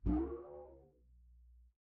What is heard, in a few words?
cartoon boing